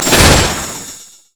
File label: teleport; LoL; diana skin02